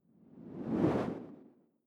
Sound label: whoosh simple flutter